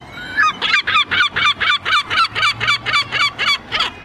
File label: fuscus long call, gulls